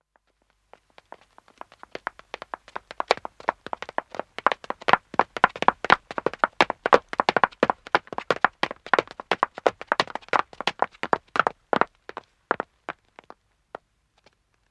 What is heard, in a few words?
Footstep wood run, 1 man 1 woman approach and stop; BBC